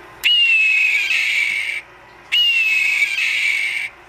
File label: Red-tailed Hawk calls; Park; Mike Koenig SoundBible